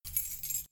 Picking up keys

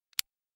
Switch Click

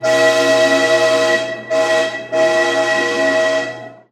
Train Whistle

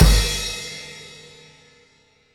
Kick Crash

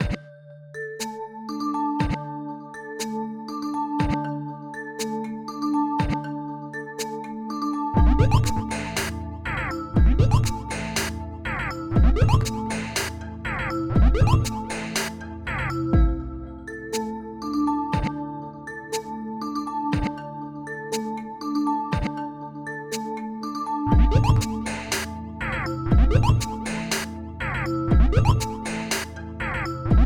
Weird Cartoon Groove

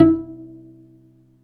Cello E4

